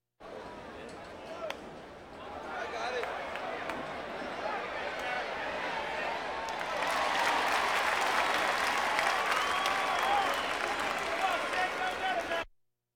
Crowds Race cars Baseball; Valentino Production Sound Effects Library

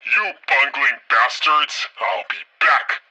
pre reload; Blind Drive

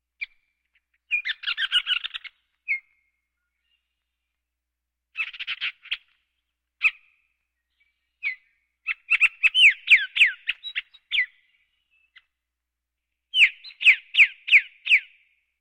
Birds

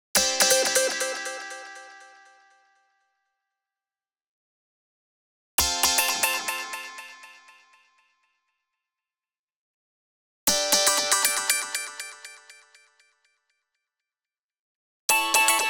Arcade Jingle Positive

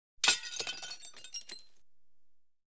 Audience Applause

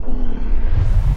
Punch Left Speed Up

